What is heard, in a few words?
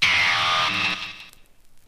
buzz zap; Zaps and Beeps